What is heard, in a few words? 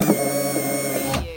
Servo Panel Sound - Servo